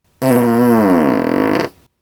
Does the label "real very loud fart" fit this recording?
Yes